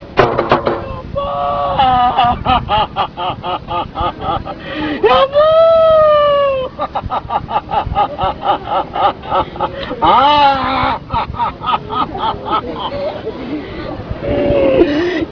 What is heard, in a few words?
wail